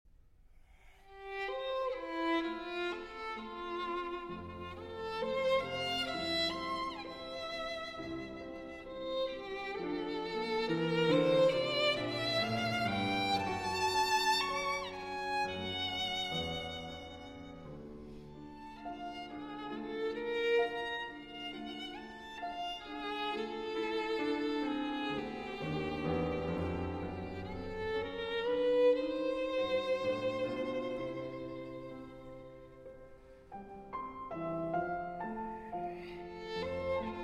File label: Violin Sonata in D Major, Andante, Live; Prokofiev; Violin Sonata No. 2; Op. 94bis III.